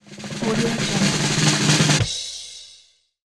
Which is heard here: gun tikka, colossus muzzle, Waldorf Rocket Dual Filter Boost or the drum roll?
the drum roll